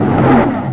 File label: THRST; trakatak